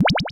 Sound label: Cry